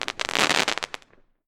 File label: crackle sm, firework